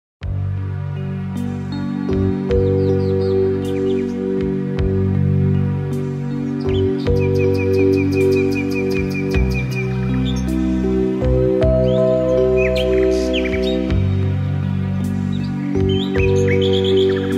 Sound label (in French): Les OISEAUX CHANTENT